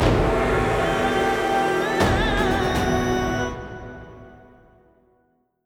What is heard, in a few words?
Free Spin Enter; netent